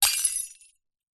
impacts glass piece broken light bulb fluorescent drop break smash